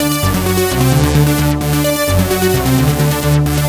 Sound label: NRG Arp, trance